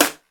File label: dry acoustic snare sound D key, acoustic snare sample tuned to the D note; snare drum samples